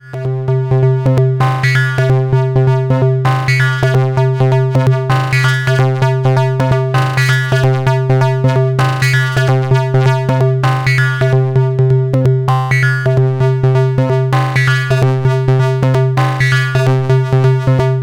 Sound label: Trident ModOSC1 FM moduliert Symmetry2; Rossum Electro-Music; Zing